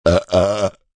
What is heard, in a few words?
Burp Throaty, Burp, throaty, double (human voice); Burping; VoiceBox